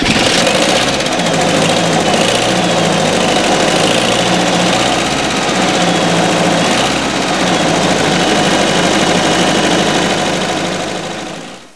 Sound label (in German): bagger